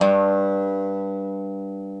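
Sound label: reference pitch dobro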